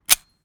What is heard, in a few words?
weapon foley drop; Real Guns Sound Pack 2; Mono